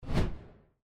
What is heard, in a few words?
cam move menu